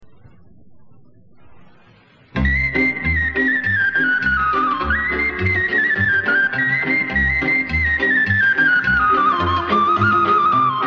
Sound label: Romania Batrineasca, Folk Dances; Petaluma; 1st String Folk Dances; Romanian